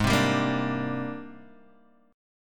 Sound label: Ab Major Sharp 11th Ab down Guitar DADGAD